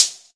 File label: Hat, Dubstep One-Shot Drums